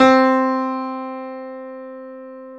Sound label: PIA GRDPI, PIA_GRAND, Roland; Sound & Vision; Gigapack I CD 2; PIA Grand 5